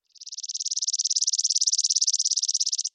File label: bird; americahimedori